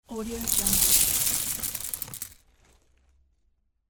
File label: cereal pouring